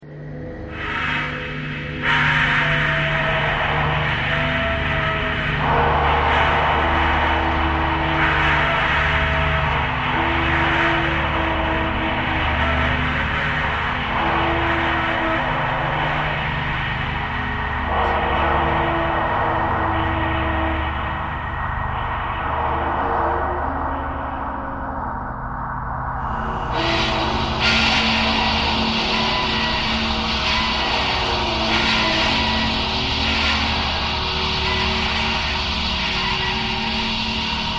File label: traffic core birds thread simple